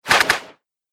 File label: Archery Three Rapid Shots, bow and arrow